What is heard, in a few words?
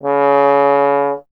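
bass trombone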